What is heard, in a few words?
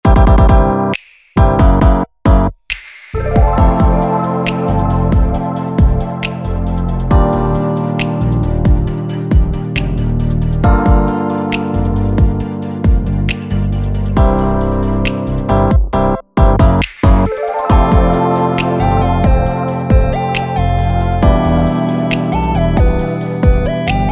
Synth Pop